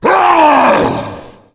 tank rock launch; zombie plague

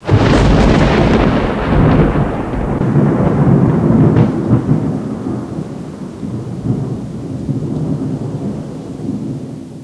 thunder; deadstrike; ambience

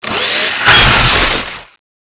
drill slash, weapons; sgdrill

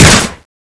speargun; weapons; cstrike

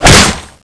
speargun, weapons; speargunm

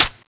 clip in; cstrike

